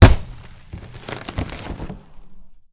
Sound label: glass impact; senn8020; Sonniss GDC